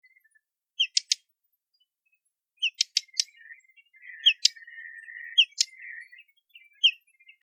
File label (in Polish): poklaskwa glos zaniepokojenia